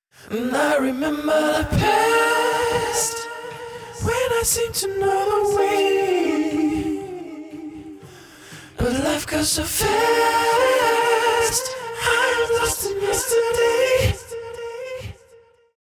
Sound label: chorus Vocals 36 beats